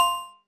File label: block ok